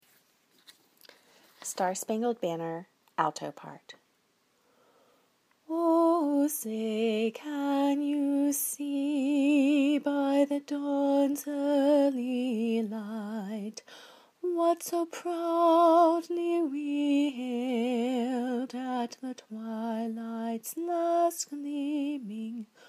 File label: alto; star spangled